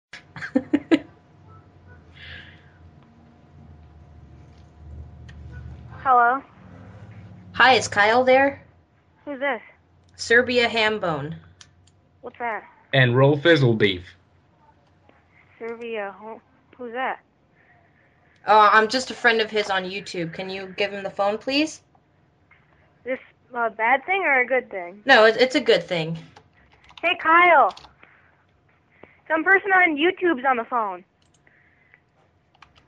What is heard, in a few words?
Serbian hambone